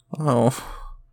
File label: Chorus really sad